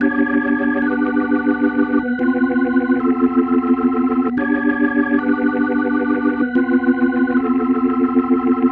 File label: Psychedelic, Organ, Loops